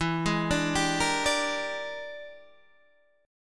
E 7th Sharp 9th Flat 5th Guitar DADGAD, chord

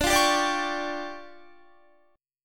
D Minor Major 7th Double Flat 5th D Guitar; 21,20,19,19,19,21; DmM7bb5 strummed

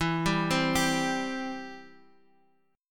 E-Minor Sharp 5th, Guitar, Drop D; x,x,2,0,1,3

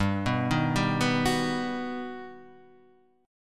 G Augmented Major 7th G down; G+M7 arpeggiated; JGuitar

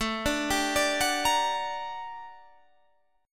G Minor Major 7th, A, guitar chord

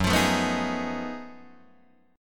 Gb Minor Major 13th Gb down Guitar DADGAD; strummed

